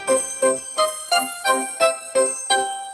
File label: funny